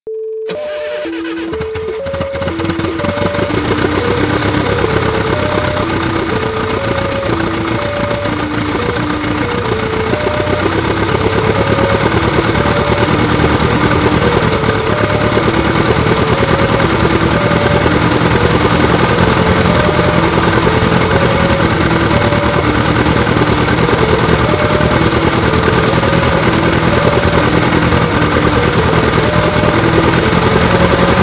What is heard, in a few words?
6 Cyl Radial Start Taxi Takeoff & Fly